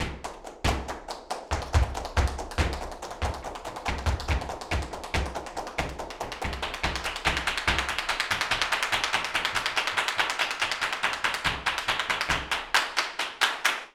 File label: flamenco tapping, jaleo